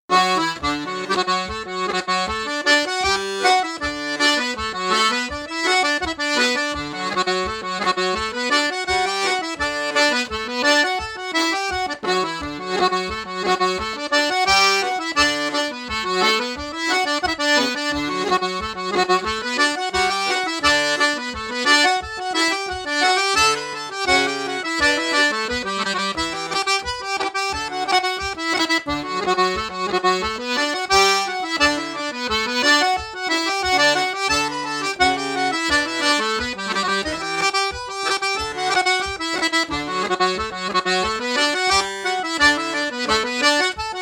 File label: piano accordion; roaring mary